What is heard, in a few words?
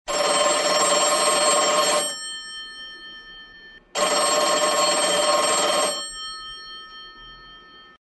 Telephone, ringtones